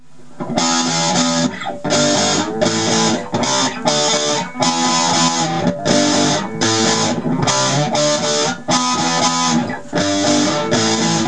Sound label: guitar